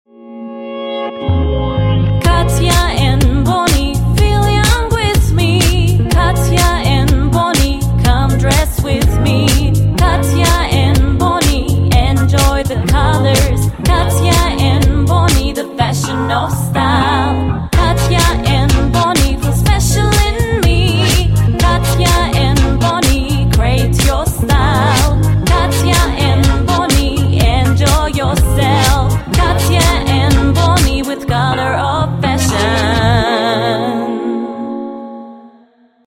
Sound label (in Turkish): vokal